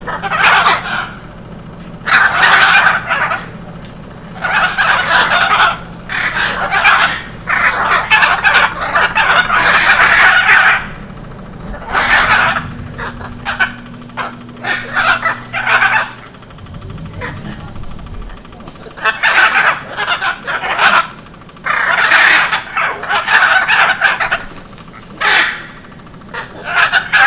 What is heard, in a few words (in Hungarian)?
jacintara hangja